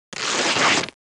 Tieng xe mot manh vai kha day keu

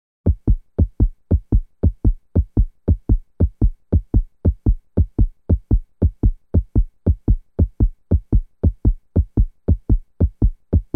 Tieng nhip tim dap

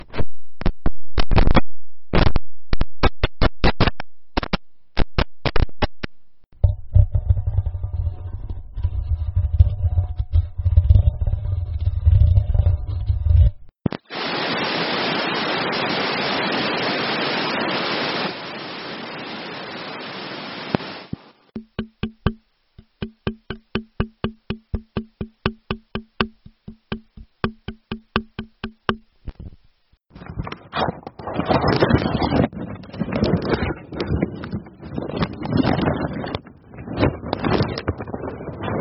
Tieng mic bi re

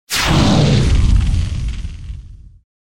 Am thanh ma thuat lua vut qua

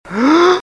Am thanh bat ngo giong dan ong

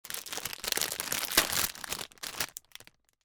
Am thanh khi mo tui giay tui nilon

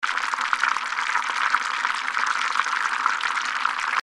Tieng chien ran thuc an tren chao

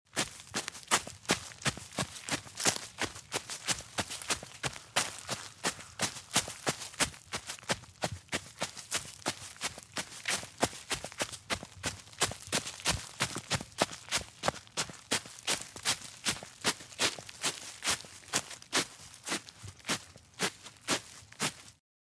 tiếng bước chân chạy trong rừng nhiều lá cây

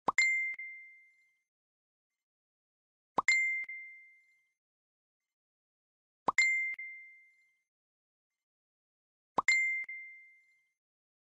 Nhạc chuông tin nhắn messenger troll người yêu

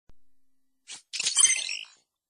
Am thanh thong bao canh bao cong nghe cao

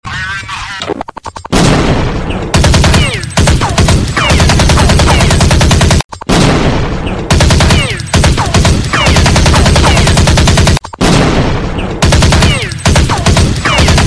Nhac chuong tieng sung; dot kich

